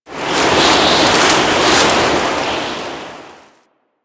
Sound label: Ocean Rain Gust; Warframe; Grineer Ocean